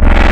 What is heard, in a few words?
Spectral Growl, wavetables